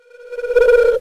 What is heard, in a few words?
teleport out; RobotRescue; music